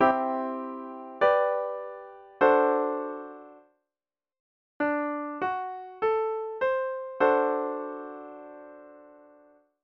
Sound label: Secondary dominant d7 chord